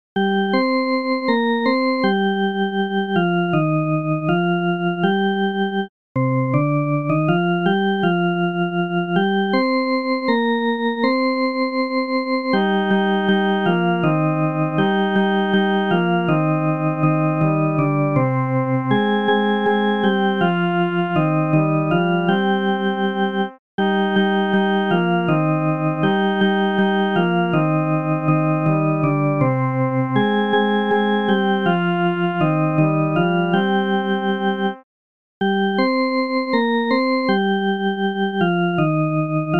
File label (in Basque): melodia